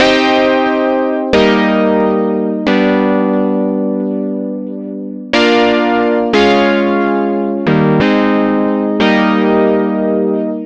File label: lead